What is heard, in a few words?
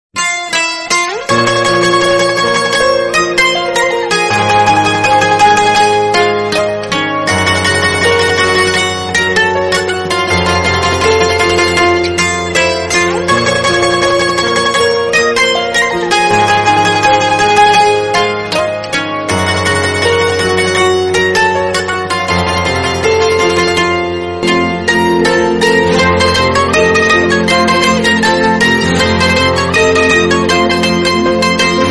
instrumental ringtone